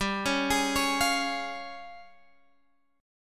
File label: Db Major G; arpeggiated